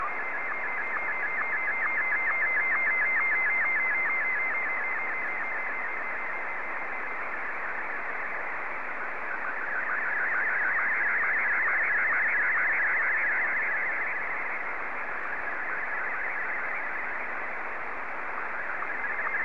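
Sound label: Martin 1, slow-scan television; VK2ODN; SSTV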